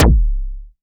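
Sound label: kick, Moog Minimoog Drums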